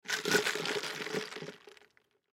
Cup Shake Move Slosh Styrofoam Ice; Shaking (Sound Effects:Objects); Blastwave; Foley

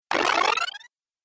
Robotic Wind Up, sci-fi robot sound effect; Robot Sound Effects; Andrew Fleming

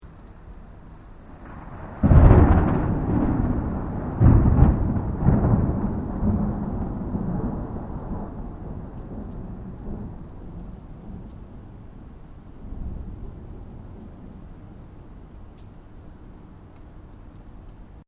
THUNDER CLOSE RAIN STEREO; Elemental weather effects

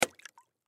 Water Bottle Drop, Water Bottle Drop Water; Drops; Foley; Objects; Blastwave

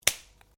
Branches Dead Snap

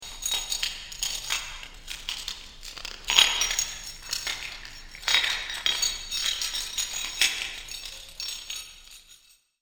Prisoner In Chains Dungeon; Scary Soundscape Sound Effects; fantasy

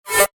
Brutal Metal Laser; Laser Sound; science fiction sound effect; star wars / trek style games

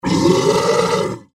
Monster Growl, Horror, Monster, Growl, Creature; Monsters; BlastWave

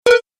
Message Error, beep

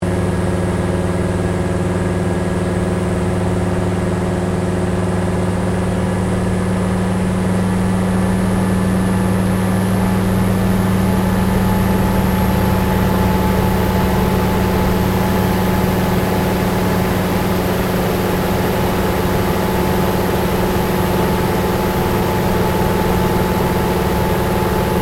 AIRCRAFT CA-13 BOOMERANG FLYING INTERIOR STEREO; Airplane Sound Effects; Vehicles

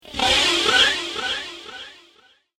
Sci Fi Firework; Science Fiction Sound Effects; star wars / trek style games

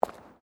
FOOTSTEPS WOMENS LOW HEELS CONCRETE STEP; Footstep Foley; Human

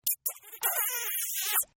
Kiss Female; Kissing; Human

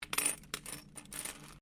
Coin Penny Multiple Move Laminate; Common coins; Money; Objects

